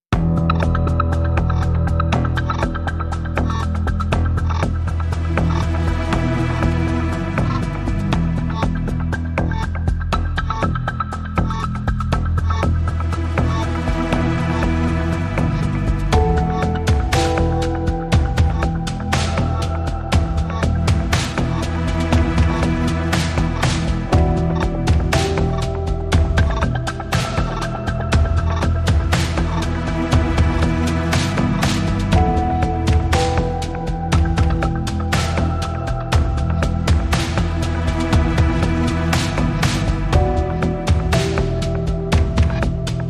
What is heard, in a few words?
No Piano